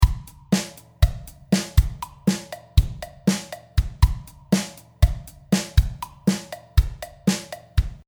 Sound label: slip beat, drum; drumlesson